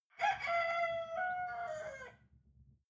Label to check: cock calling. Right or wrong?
Right